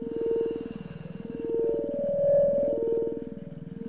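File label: droid probe hover loop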